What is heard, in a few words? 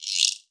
SPIN; pandemo